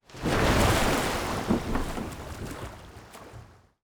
warship movement; ships siege

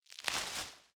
gather fruit

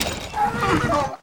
Special Building (Celts); 0AD; building Completion

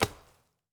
lumber tree

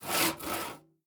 construction saw

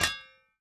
mine metal

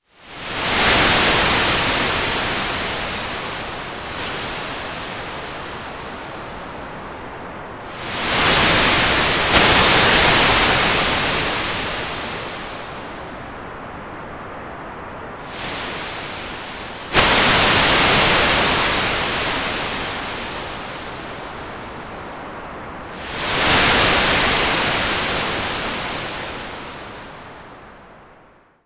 wave, ambient water

